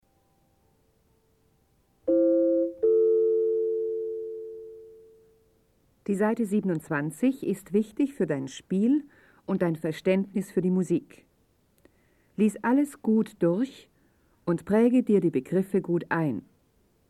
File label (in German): Spiel Blockfloete